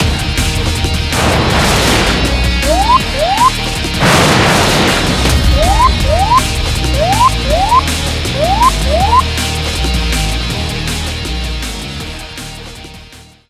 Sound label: mega win; Burning Reels; wazdan